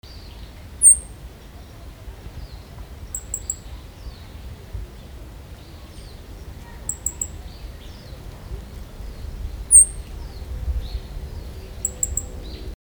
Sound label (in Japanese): hojirom